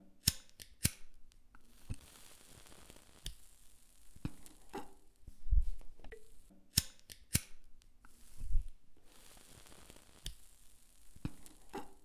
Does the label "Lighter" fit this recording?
Yes